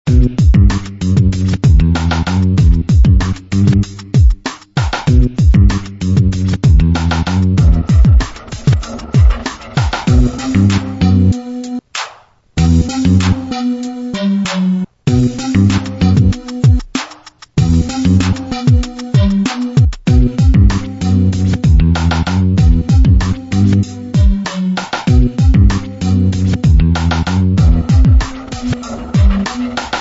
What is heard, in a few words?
Energy Hop